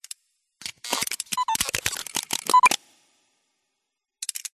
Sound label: science fiction steam punk machine operate clicks beeps break malfunction; Audio Mangler